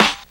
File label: dirty snare drum a sharp key; snare drum samples